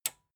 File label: metal button tiny release disable